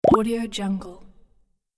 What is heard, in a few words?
cartoon multi pops; cinematic-designs